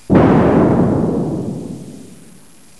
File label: Explosion